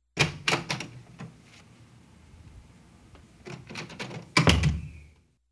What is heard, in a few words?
Classic Door Open Close